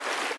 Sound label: fast water, river sounds